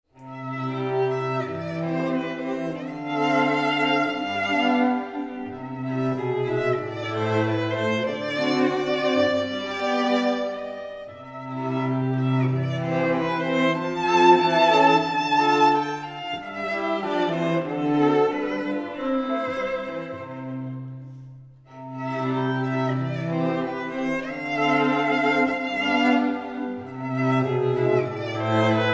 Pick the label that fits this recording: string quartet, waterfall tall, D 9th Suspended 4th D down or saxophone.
string quartet